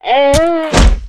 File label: Mob die; Monster; 뇌영대; 뇌영조원